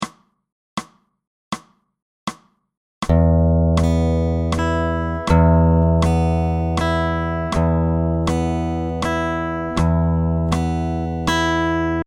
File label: fingerpick quarter, guitar fingerpicking